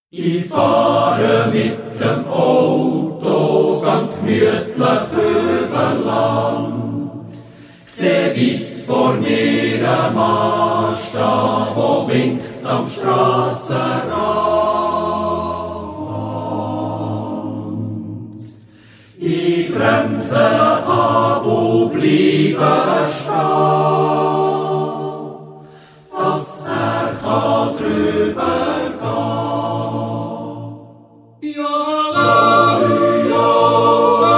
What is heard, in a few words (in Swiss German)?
Ungerwägs (Chor)